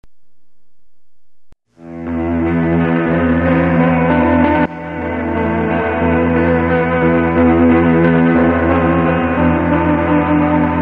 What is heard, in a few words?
Microsynth Moody